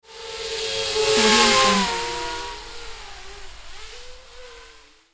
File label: little drone flyby; kudlaproduction